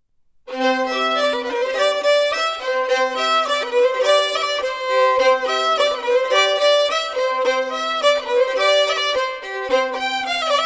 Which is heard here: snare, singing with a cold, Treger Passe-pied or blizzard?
Treger Passe-pied